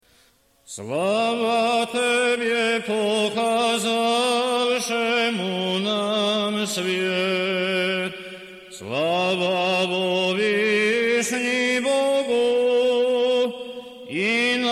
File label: Osmoglasnik II glas